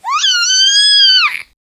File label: baby animal scared scream